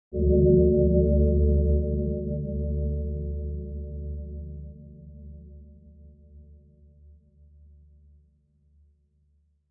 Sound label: large bell reverb; quake2; jitspoe